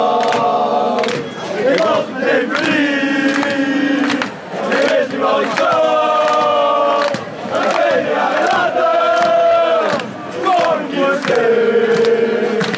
chants

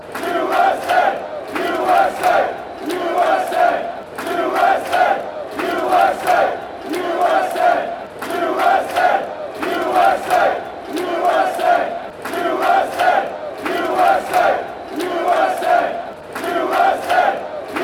usa claps

